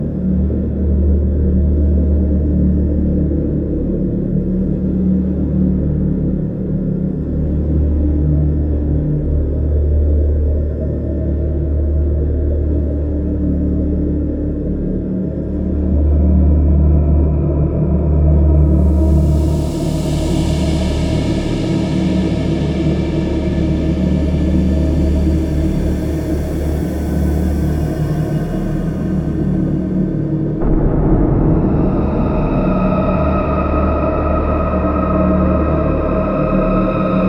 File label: Fortress ambience